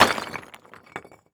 rock pile drop; prosoundfx

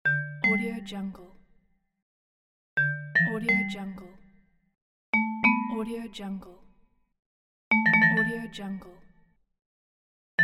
vibraphone massage

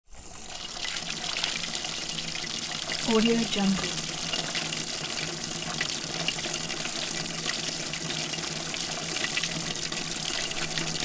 water trickling

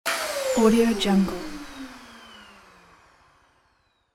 turn off

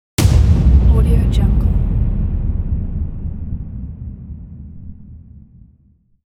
explosion

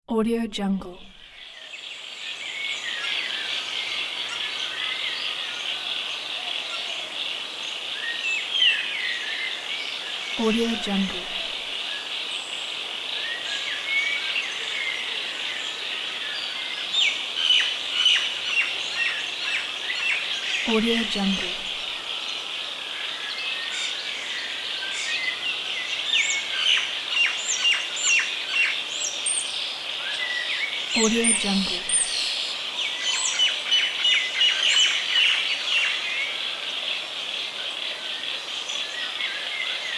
jungle sounds

